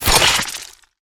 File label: Web Shot; Lurker; Evolve; Gorgon